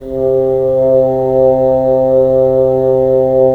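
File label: wind bassoon; LA Composer vol. 3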